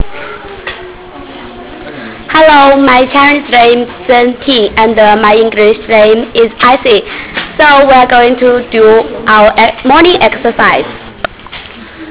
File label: morning exercise